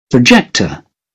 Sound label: projector